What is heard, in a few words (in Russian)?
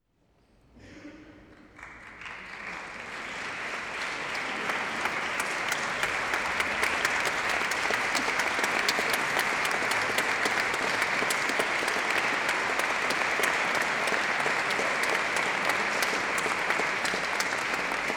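Концерт в консерватории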